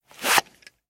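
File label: police baton sounds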